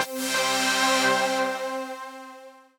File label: melodic sound pad C